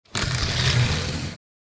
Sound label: Heavy fabric curtain slide rail rattle; household